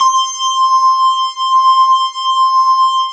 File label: Analog, Synthesizers; Soli-Music; World of Synthesizers VOL-1